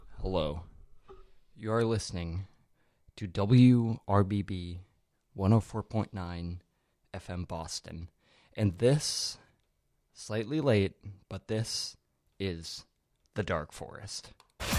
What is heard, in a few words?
dub techno